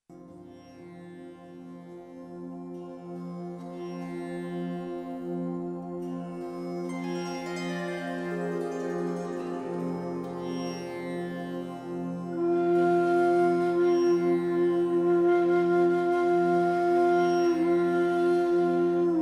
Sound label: Raga bhimpalasi